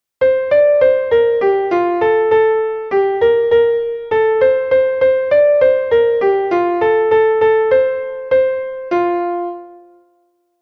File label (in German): dornroeschen war ein schoenes kind, klavier